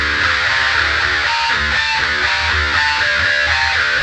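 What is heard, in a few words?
Guitar (metal); misc; Sonic Foundry (Sony Creative Software); Ugly Remnants VOL-2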